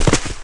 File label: crow bodyhit